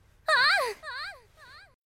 squeal; gabriel dropout

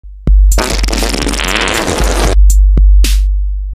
fart remix

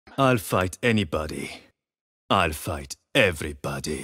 agent selection sound; yoru

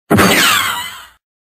laser shot plaster

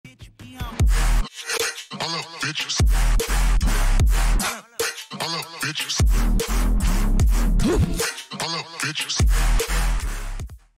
twitch sub sound

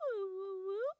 uwuwu

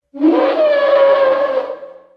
brachiosaurus roar

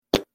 quick toot

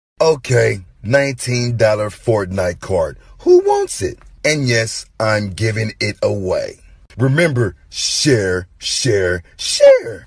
fart

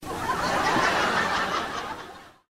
Canned Laughter

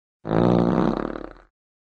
slow fart

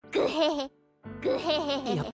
guhehe

